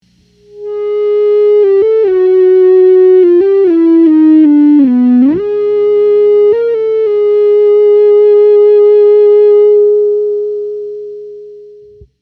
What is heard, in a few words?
EBow